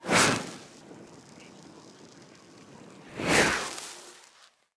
illusion fear draw sheathe

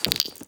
gem up; gems

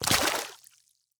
water walk

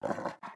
wolf breathe run

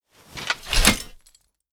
blunt 2 hand sheathe

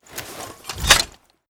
axe 1 hand sheathe

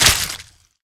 bite medium, creature

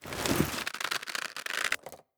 bow pull

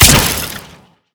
frost bolt

